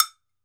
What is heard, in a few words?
TOKE BEL, Drums&Percussion, Toke Bell